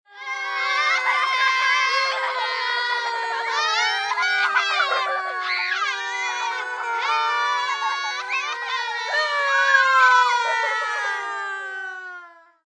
Group Crying, Crying, Group, 4-6 children, sad, wailing, sobbing, whining, yelling and screaming; distressed; kids; males; females; girls; boys; VoiceBox